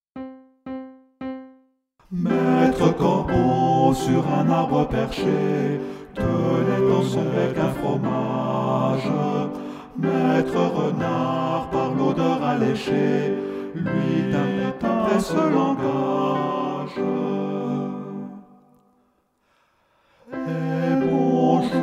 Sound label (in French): soprane; LE CORBEAU ET LE RENARD (les 4 voix)